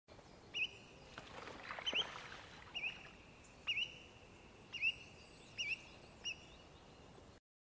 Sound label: Spotted Redshank; آبچلیک خالدار